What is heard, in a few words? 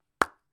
a pop (blop) made with a small plastic surprise shell from a kinder egg; sound effects